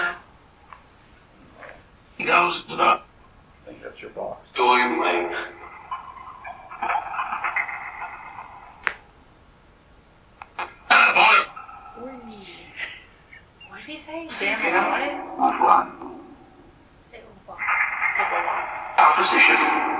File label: Living Room Phasma Box